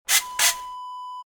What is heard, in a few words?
broom sweep two